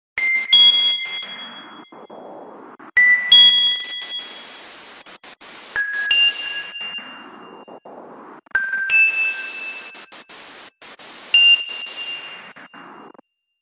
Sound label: nokia 6300 tinkly